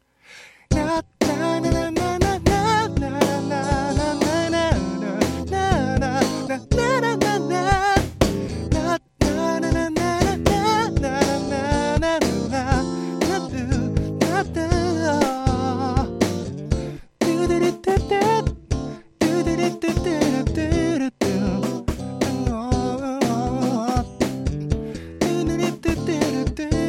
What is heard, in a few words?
drum